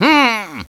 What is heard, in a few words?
angry; DS; ML BOWSERS INSIDE; Luigi